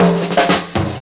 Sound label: Fills, Roland; Sound & Vision - Gigapack I; Perc